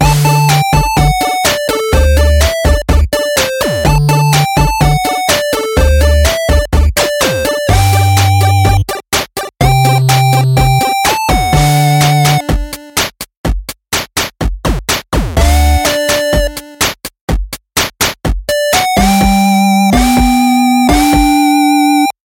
8-bit

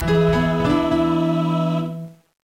Jingle

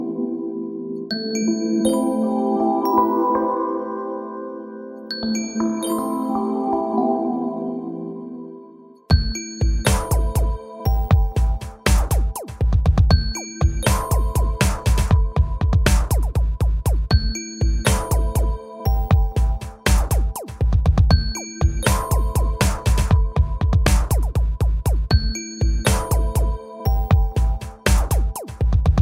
ambient

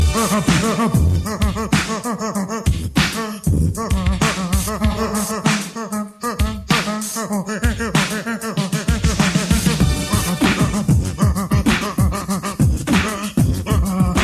Beatbox

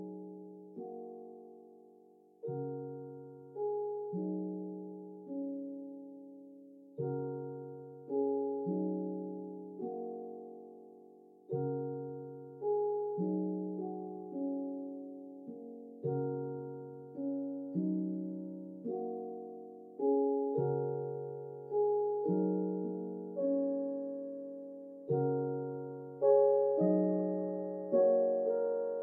Calm

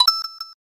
Coin; Yoshis Island

